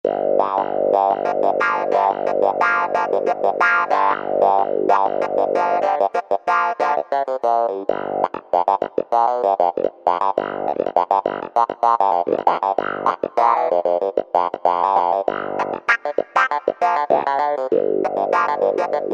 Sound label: Wah Clavi, Yamaha PSR-3000; Factory Voices